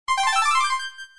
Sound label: pickup; cave